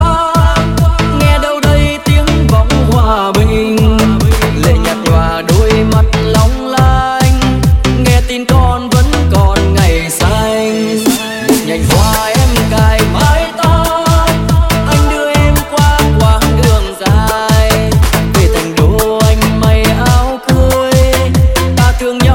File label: Remix; Nhạc Chuông